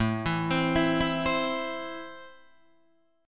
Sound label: A Minor, Guitar, Open D